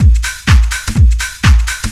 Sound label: shutter; Zero-G Deep House; 125bpm